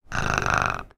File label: Kitty Cat Purring; creatures organic; aronwk collection; ndaudio